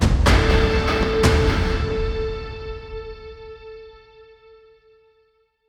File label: Rank Up Cue; Warframe; Cinematics; Veilbreaker